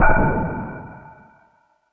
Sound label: FX Sounds, Drum Machines